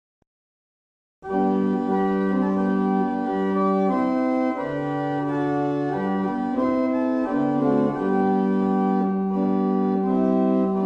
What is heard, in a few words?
barrel organ; Mattishall